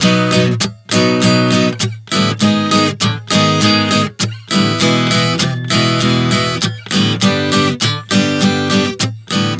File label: Progression 100 B-G#m-E-G, Acoustic Guitar, Chord Progressions 100 bpm